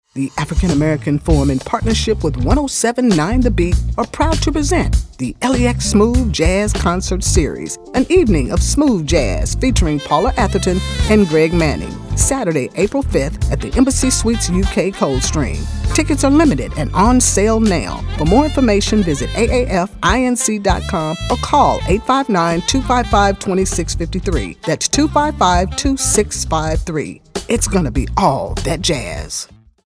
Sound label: Smooth Jazz